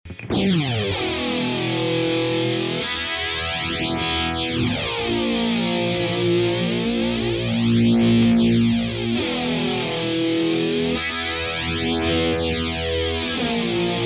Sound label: hvy zero thru flange